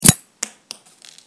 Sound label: pin; weapons; cstrike; csgo